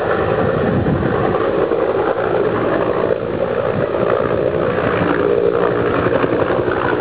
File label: helo wind